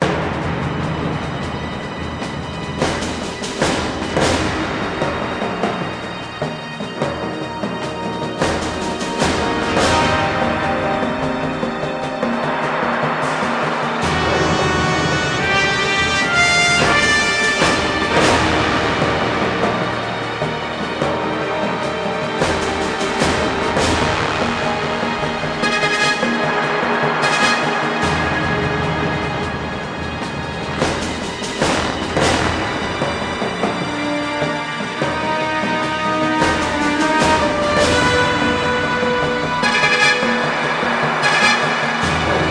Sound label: Sicily Action